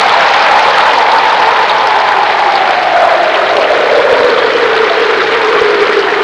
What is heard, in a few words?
rain storm